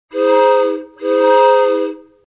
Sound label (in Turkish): tren düdük sesi; tren duduk sesleri